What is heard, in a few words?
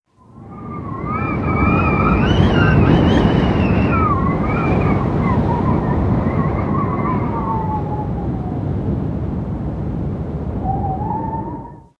Whistling Wind